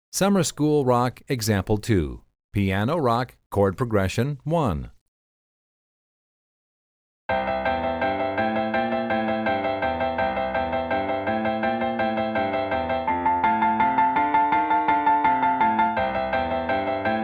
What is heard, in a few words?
CHORD PROGRESSION